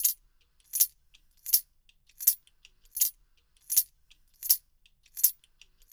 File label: JINGLE, TAMB + TRIANGL; Spectrasonics; Liquid Grooves 1 & 2